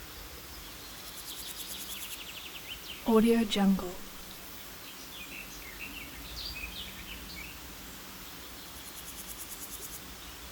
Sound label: forest birds small stream